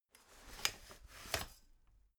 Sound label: folding chair fold slow